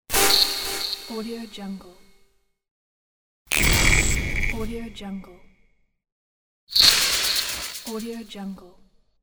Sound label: electric jump scare sound; game sounds; پرش برقی